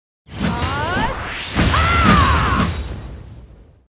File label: Finish Attack EX SFX